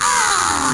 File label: Aww; GBA; Toad